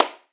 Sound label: Clap